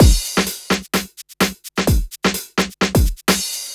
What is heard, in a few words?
Housebeats, 128BPM; Loops; Vengeance Samples